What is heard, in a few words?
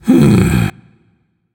nv sigh; smite; zhongkui